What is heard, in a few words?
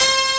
Victory Fanfare; Final Fantasy USA - Mystic Quest